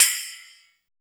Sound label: SPRINGY, TECHNO; Drums In Your FACE!